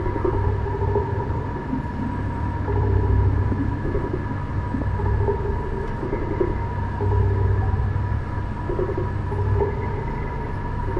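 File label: lithoid ambient transport ship hum; Stellaris; lithoids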